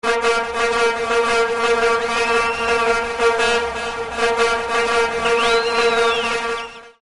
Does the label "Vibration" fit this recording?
No